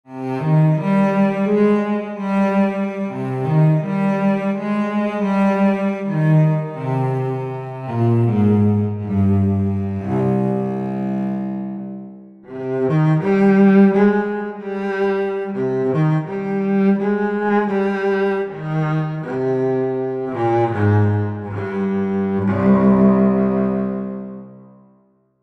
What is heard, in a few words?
Emotional Cello Moderate